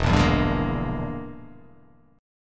E 7th Sharp 9th Flat 5th, chord

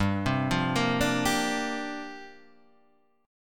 C 7th Suspended 2nd Suspended 4th, G

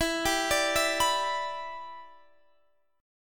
E Minor 7th, down, Guitar, Drop D

